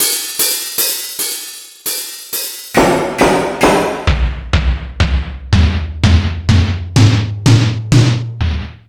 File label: Mega Drums, Drum Machines